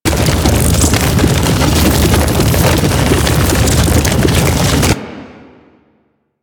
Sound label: Infested Big Ashen Roll Loop; Warframe